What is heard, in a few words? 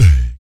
VOC PERC, Vocal Planet Jazz FX BASS PERC